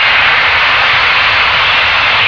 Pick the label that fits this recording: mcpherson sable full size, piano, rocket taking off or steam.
steam